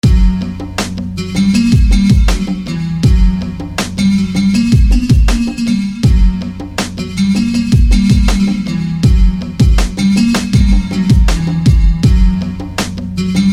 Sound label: Ethnicity Pluck